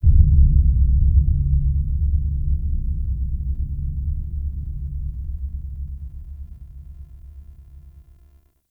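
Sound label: Deep Rumble low frequency effects; Warframe; Tau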